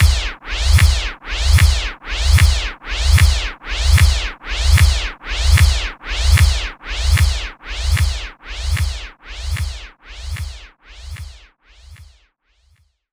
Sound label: Scratch, Roland JV-2080